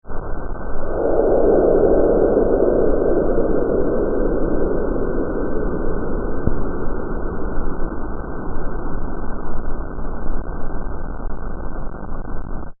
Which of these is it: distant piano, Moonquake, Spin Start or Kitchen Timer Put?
Moonquake